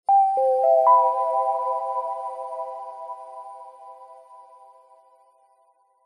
multimedia game sound zen game soft warm relaxing positive tone